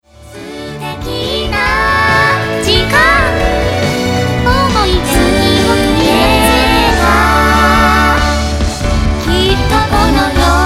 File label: cute voice